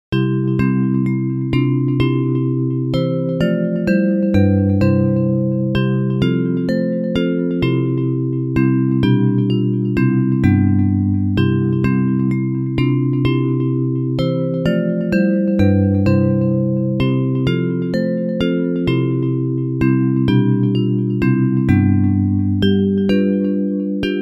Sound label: Bells